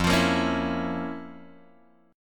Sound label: F Major 7th Suspended 4th Sharp 5th; FM7sus4#5 Chord {1 X 3 3 2 0} strummed